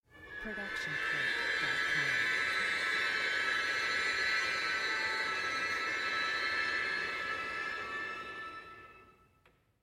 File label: string dissonance